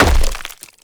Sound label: Blunt Heavy Impact Flesh; Weapon Impacts; Player; Combat